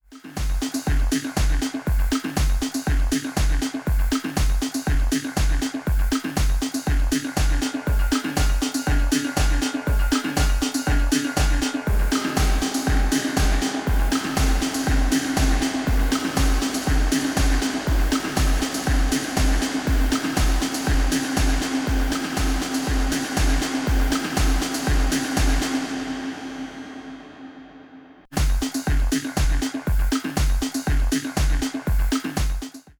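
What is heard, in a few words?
Reloop RMX-90 DVS Reverb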